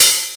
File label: quiet open hi hat one shot a sharp key